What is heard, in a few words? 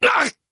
damage